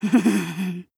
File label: "mhmhm"; toko; drae